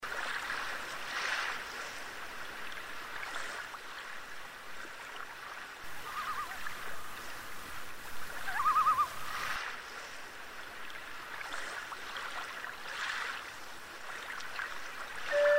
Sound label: Loon Call